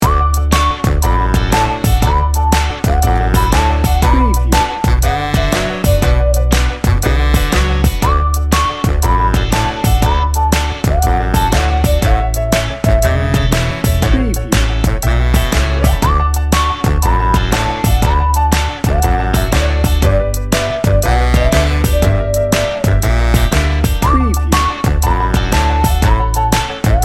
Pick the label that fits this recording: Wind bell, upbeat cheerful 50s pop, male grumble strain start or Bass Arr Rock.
upbeat cheerful 50s pop